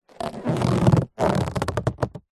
foley balloon or inflated surgical glove handling movements; zapsplat